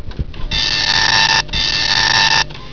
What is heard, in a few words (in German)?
drucker; winpolis